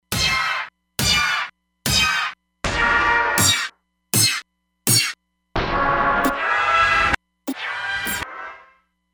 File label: Smear Hit